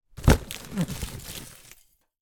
foley gift present wrapped box remove from under synthetic christmas tree